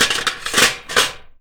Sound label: CLATTER, Anime Sound FX; COMIC